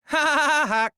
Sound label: laugh; monodam